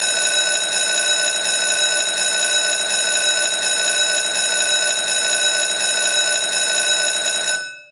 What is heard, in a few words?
win ring steps; games; poker; American Poker The Legends II